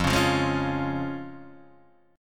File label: C sharp Major, chord; C#/E#; 1,4,3,1,2,1